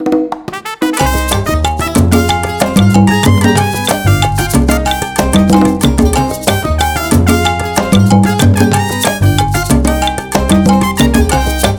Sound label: Latin Style, ringtones; Honor Pad X7